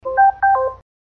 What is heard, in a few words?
SFX AMAZON ECHO ANSWER HANG UP